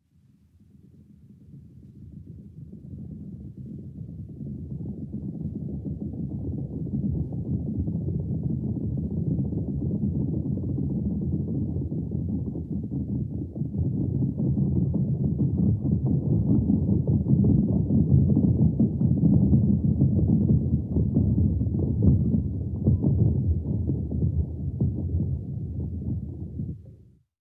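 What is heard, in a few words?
rhinoceros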